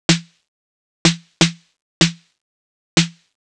drum loop noclap percussion 125, Classic Chicago House; Sample Magic; Drum Loops